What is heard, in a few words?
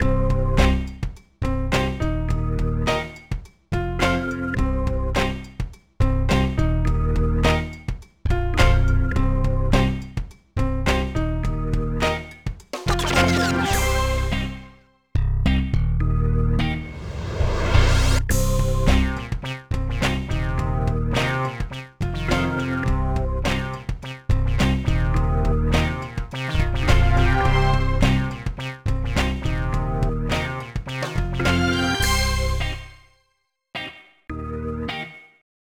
Groove; tkkg detektiv gesucht; ds gamerip 2007